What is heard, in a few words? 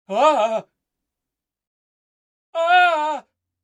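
surprised male voice effect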